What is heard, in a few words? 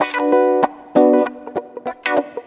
Electric Guitar